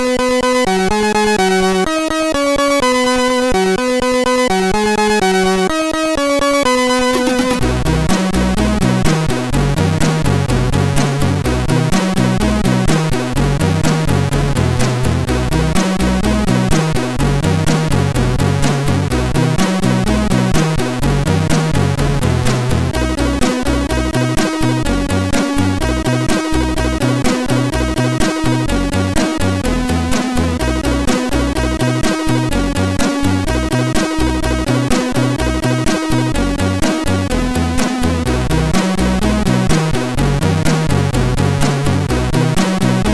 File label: Children Songs, MOS6581R4